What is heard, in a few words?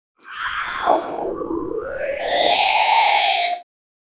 phone hover subtle tap, ambient hum